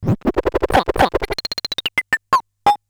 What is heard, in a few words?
Bent Talking Teacher, Circuit Bent